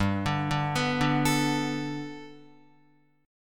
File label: G Major Add 9th, Gadd9 chord, Guitar, 4F,4A,5C,5G,5C,6E; 2,5,2,4,2,5; Gadd9 arpeggiated